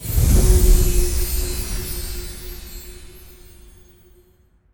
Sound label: big win text change